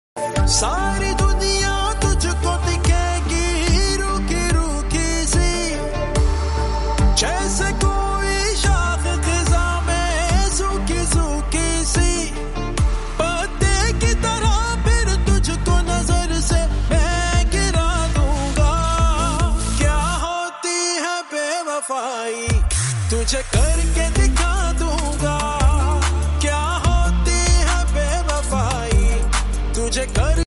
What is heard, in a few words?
pakistani drama ost hindi